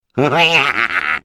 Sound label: laugh, es; Defective Turret; sp sabotage factory defect